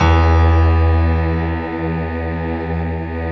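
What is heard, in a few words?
E 1, PIANO CHOIR; Best Service; Advanced Media Trax Modern Composer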